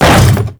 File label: Hammer Boss Step Default, footsteps